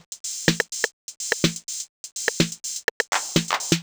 drum loop before top 125, Classic Chicago House; Sample Magic; Drum Loops

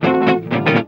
EGUIT BREAKS; Best Service; World Colours

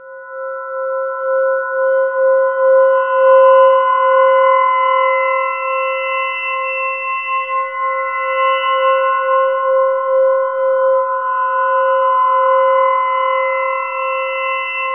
SING GLASS, MYSTERY GLASS; Zero-G Ambient 1

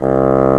C 1, REED; AKAI S-Series CD-ROM Sound Library VOL-8

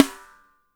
SNR; Spectrasonics; Burning Grooves

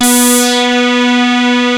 OSCAR B3, synthesizer; Hollywood Edge - Giorgio Moroder Rare Synthesizer Collection

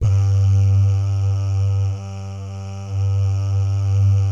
BS BAH; ILIO; BAHS GUYS

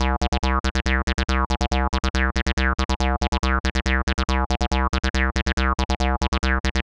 140 C bass, Trance, Bass Loops; Trance Explosion Vol1

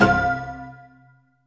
IMPACT; Back In Time Records; Big Bang; TDP+BELLS2

